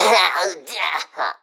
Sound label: Rogue Death; Pecheneg